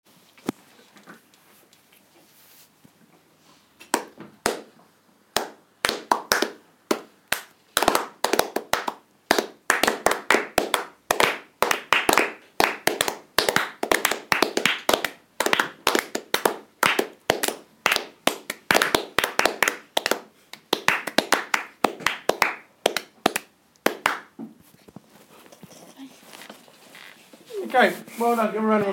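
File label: clapping piece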